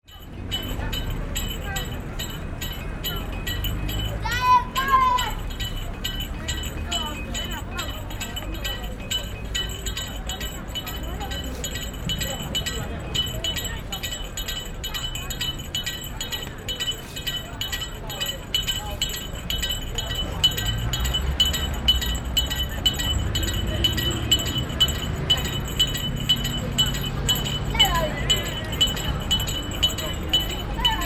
sugarcane juicer, Burma

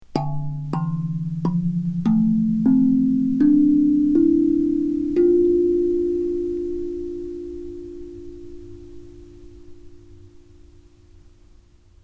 Hapi Scale D Pygmy

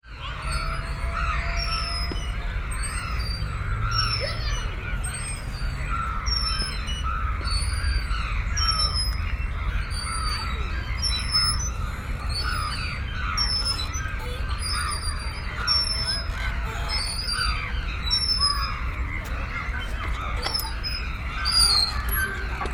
swinging, Burma

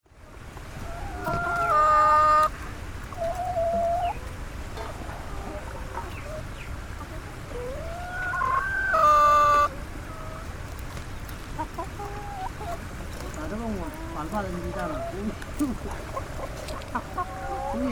caged chickens, nepal

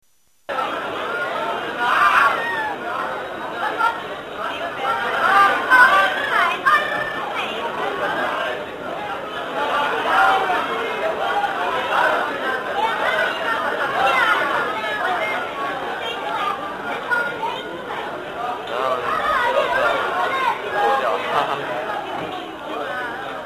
eiffel observation

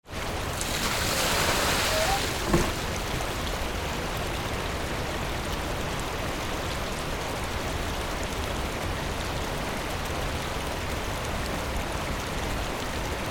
Rice pounder Sa Pa Vietnam

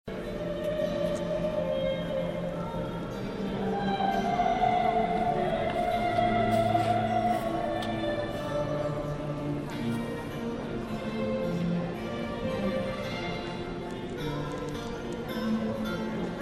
Peterborough UK Cathedral Opera Rehearsal

